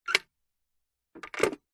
household telephone vintage rotary style pick up then hang up receiver gently